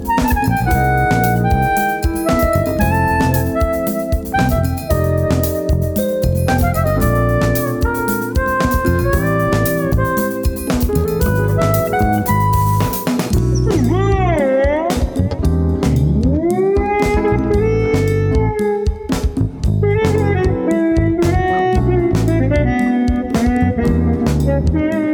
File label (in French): Extrait Funk